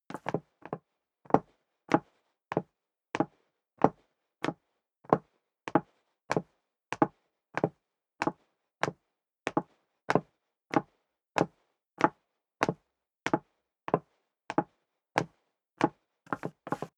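step leather shoes wood parquet downstairs sequence, footsteps; schritte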